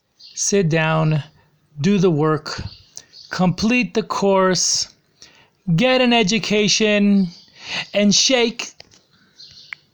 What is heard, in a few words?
shake